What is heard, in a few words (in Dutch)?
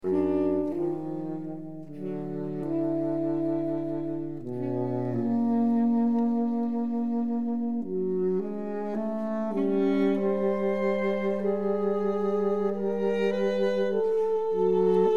Sopr-, alt-, tenor- en bariton-saxofoon